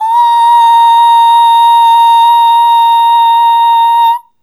Ooh Long A#4 Dry; Harmonic Building Blocks

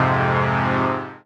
Fm Dub Chord

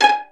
PIZZ VIOLNG; E-MU Formula 4000 Series Vol. 5; Protozoa; Proteus2 Instrmt

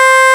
SYNT BAS C5; Big Fish Audio; Synth City

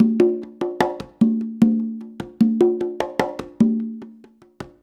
CONGA, Modern Percussion Loops

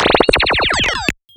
Laser Fast

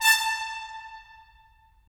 BRS, Tps Stacc; Roland - LA Composer vol. 4

